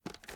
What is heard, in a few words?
reload rattle, weapons; gmod; viper